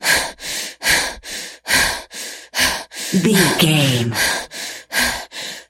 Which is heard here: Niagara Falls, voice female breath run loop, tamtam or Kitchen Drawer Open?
voice female breath run loop